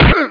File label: hit head; CD Action; outlaw